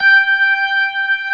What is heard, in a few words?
B3 G, organ; AKAI S-Series CD-ROM Sound Library VOL-8